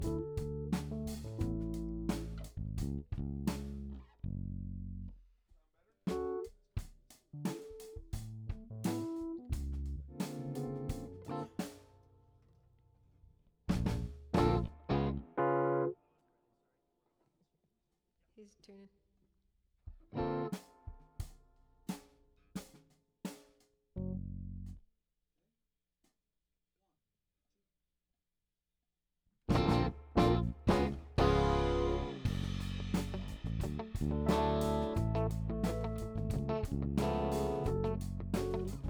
Rough Mix L

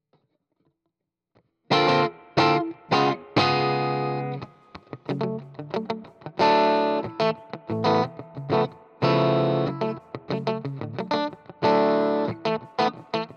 GTR

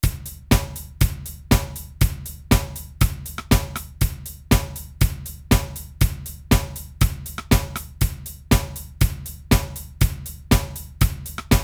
Beat

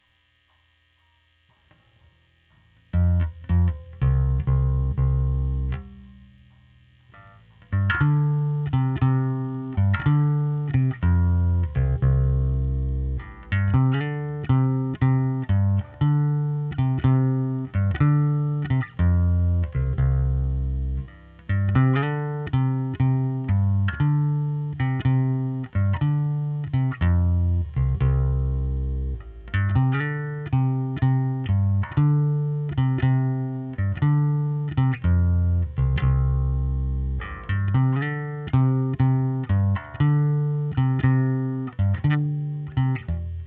Bass OD

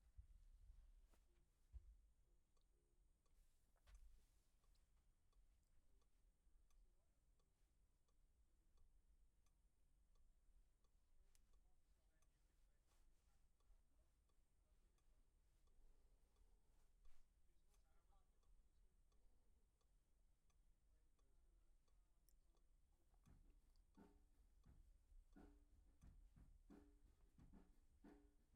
Act Guit L

